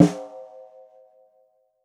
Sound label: Steel Open Sound, Snares; Drums; Ghosthack - Free Snares 2023